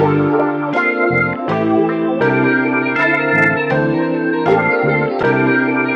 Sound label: keys, phonky loops